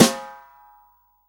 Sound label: SWING SNARE CHROMATIC, Real Drum Kit; Best Service ProSamples vol.43; REAL DRUMS